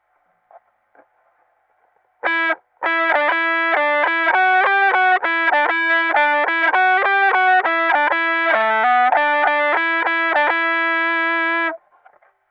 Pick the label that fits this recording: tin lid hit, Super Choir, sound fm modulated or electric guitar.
electric guitar